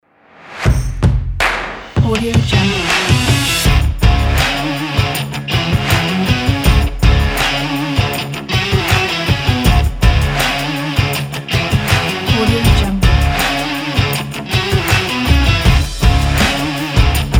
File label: stomp and cinematic rock pack